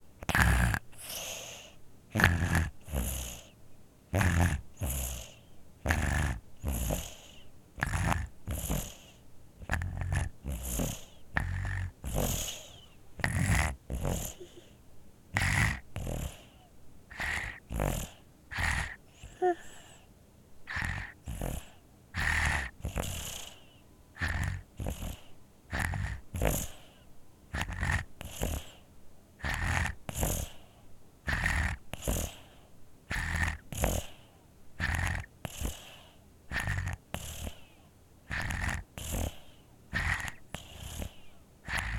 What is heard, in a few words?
Baby Infant Sleeps Snores With a Cold Congested; 2496SoundEffects; Oh Baby! Pack 1 Production Sound Effects